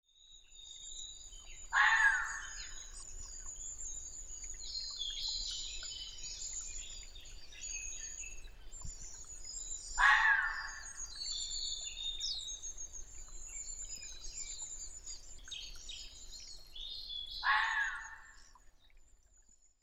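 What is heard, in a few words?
Red fox; koe; kitsune; tsumagoi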